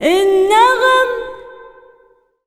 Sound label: SINGER A, Arabian Traditions; USB Soundscan vol.43; AKAI